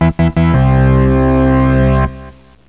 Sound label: organ